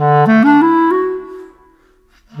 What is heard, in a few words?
Clarinet; Sonic Foundry (Sony Creative Software); Orchestral Series VOL-4; Rock and Pop; Rock-Pop 05